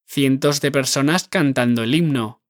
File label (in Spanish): cientos de personas cantando el himno